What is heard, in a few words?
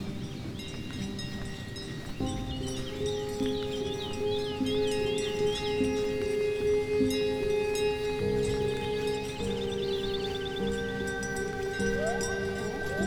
piano